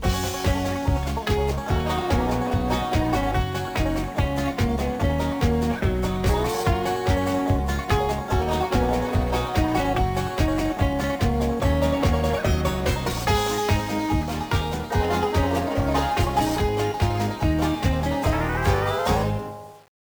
Theme Song (Instrumental Snippet); Timmy Time (DS) (gamerip) (2011); Minigame Theme 1

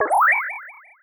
Se Sys Emotion Down

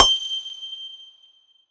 UI Menu Element; Skylanders Cloud Patrol; Arcade, iOS, Mobile

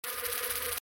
insect swarm loop; The Binding of Isaac; SFX Monsters

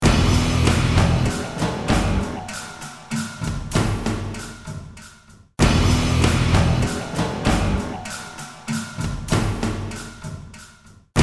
mus ui long stab; Mortal Kombat Komplete Edition

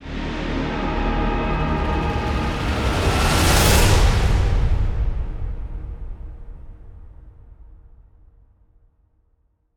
Stinger; extinction deluxe edition; ps4 windows xbox one

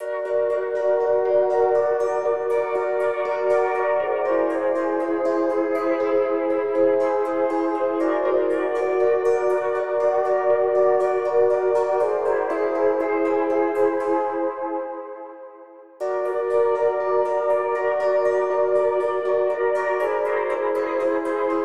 Pads 120; Cyclogenesis

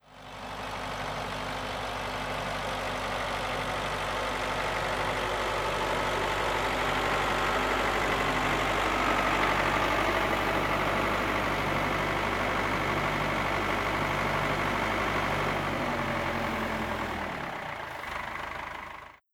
Mainz Tractor

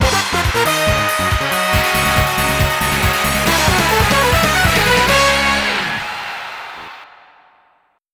Goal; super sidekicks 3 the next glory; neo geo cd neo geo

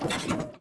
Land Soft; Test Drive Off-Road 3

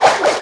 Tap Tricks

